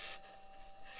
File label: mic, mars2020 edlcam